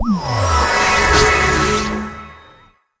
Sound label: Summon; Seer; GamigoNA